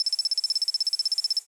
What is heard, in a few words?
digital typing; SoundsCrate